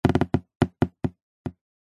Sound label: water drips sudden short on thick cardboard; nature